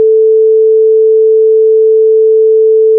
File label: Sine wave 440